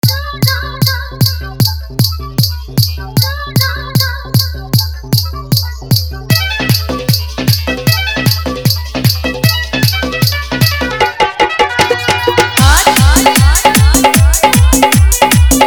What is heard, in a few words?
Dhollki Bass